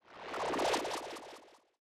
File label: heal; abilities